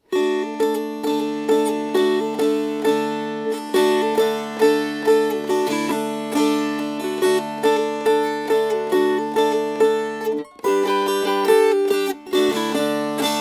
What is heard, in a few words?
Strummed; Jingle at the Windows